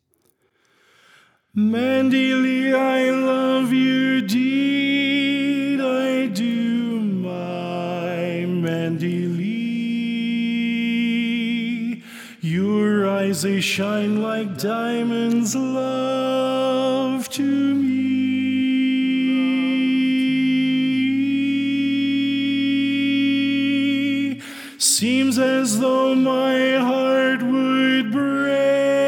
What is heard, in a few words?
Lead